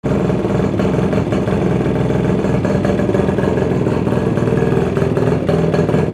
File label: VEHICLES